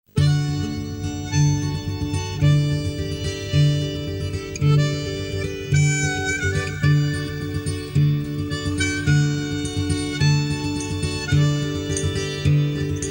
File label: rainbow marmelade playback